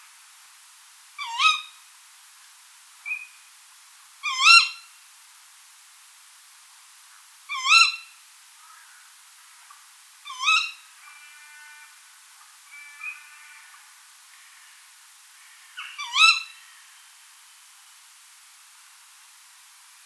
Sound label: call, tawny owl, Strix aluco, Background: Teal, Hooded-crow, Jackdaw; The recording was made with an unattended automatic apparatus.